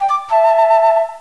Flute Beep; CatFind

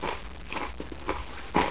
Light Eating

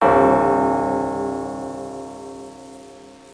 2 BELL; PUPPIES

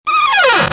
DOOR C; NOAH DEMO

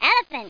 elephant

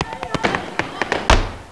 STEPS

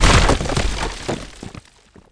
falling wall; Alexander

